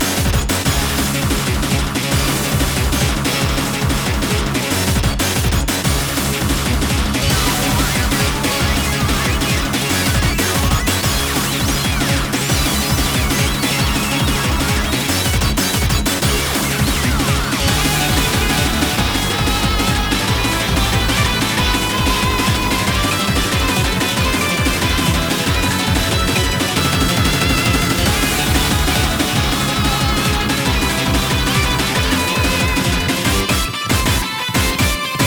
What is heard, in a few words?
Finalboss Loop